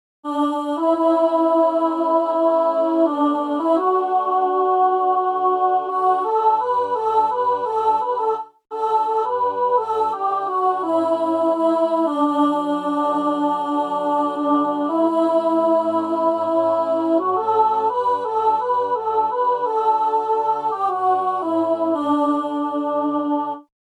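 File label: soprano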